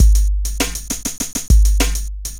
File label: drloop, drumloops, Vinyl Attack; Masterbits